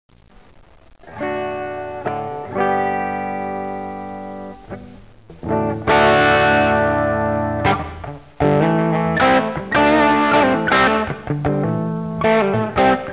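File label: dirt strat; AMZ FX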